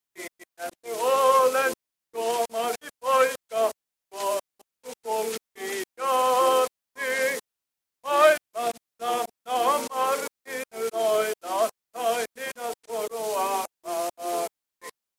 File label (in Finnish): kohinaportti 20dB tartunta 500ms